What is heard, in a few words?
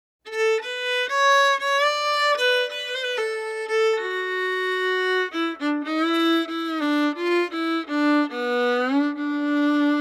Blues